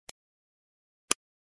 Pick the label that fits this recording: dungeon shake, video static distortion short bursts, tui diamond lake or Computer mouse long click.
Computer mouse long click